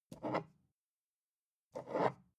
glass bottle pick up slow

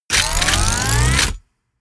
wind up

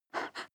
breath female short

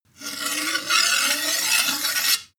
sword blade scrape long

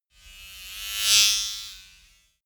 servo small whoosh

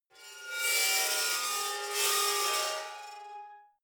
metal scrape rich straight

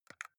console controller xbox arrow key press fast

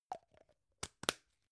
gum plastic box close

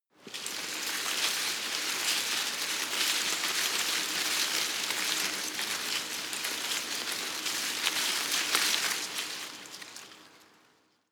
tree small leaves rustle medium

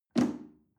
chair wood take medium

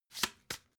playing cards shuffle single

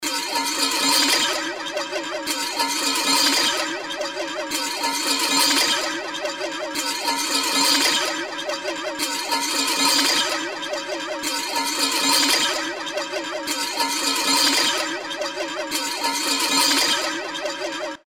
bowl

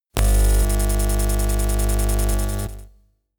buzz

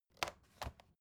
folder close faint

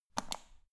power button turn on off hard quick

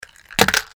matchbox falls

